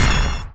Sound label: flash; ultrakill